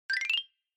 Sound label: Xylophone Fast Short Up